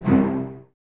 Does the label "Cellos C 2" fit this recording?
Yes